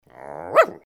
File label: Woof